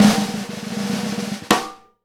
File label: snare roll hit; E-MU Producer Series Vol. 8 – Platinum Phatt; Live Snares